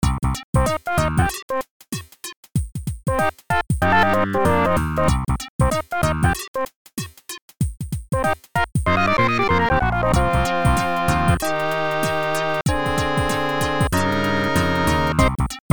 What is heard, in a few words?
RINGTONE